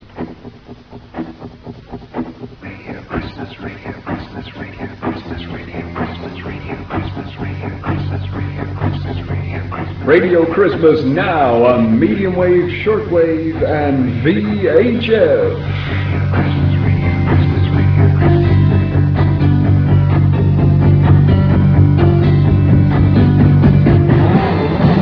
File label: jingle